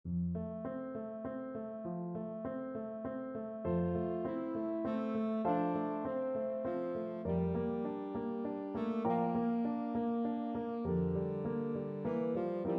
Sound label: tenor saxophone